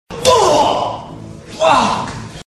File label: slap